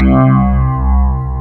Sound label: pluck C2, FM pluck